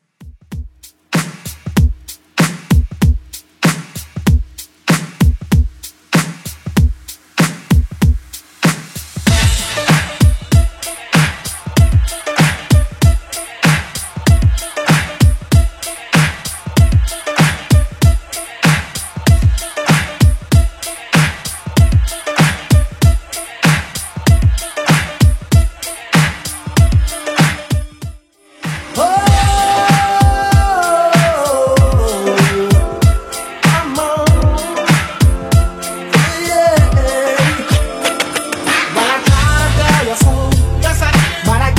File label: 90s Simple Redrum 96bpm